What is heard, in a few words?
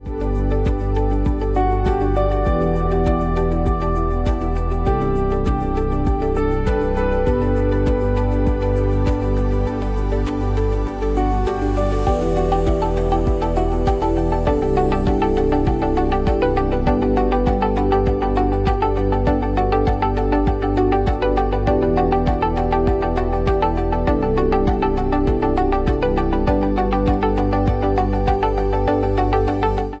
calm confidence, Factory & Automation Music